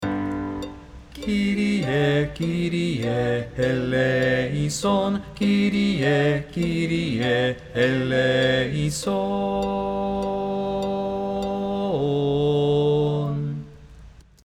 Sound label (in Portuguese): baixo; Kyrie